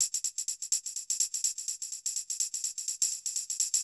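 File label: percussion, house, 125, drum; eJay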